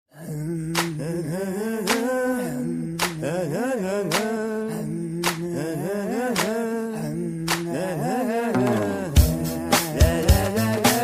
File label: Euuueheehe